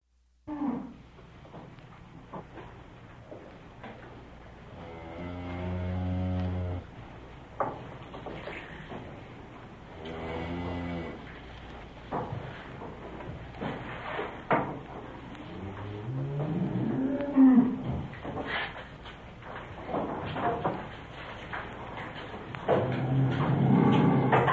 korovnik zvuki v korovnike

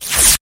zvuk razriva karti

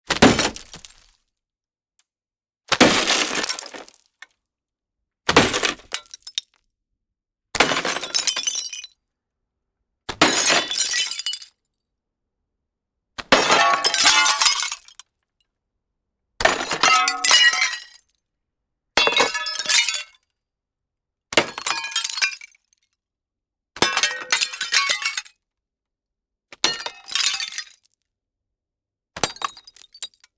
zvuk razbivayushegosya stekla neskolko variantov

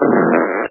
pukane

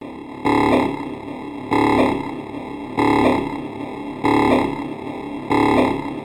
zvuk iskusstvennoj cifrovoj signalizacii

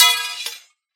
lyazg dvuh mechej drug ob druga

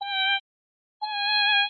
skripka interval misticheskij

